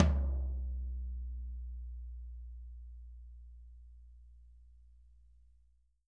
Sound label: T14 MP R, TOMS; Living Drums; Sampleheads; Peter Erskine; PB KICK+TOMS